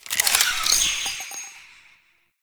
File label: Heavy Pistol Reload A; Warframe; Weapons; Zariman Heavy Pistol